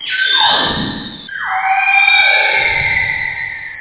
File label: whale; creatures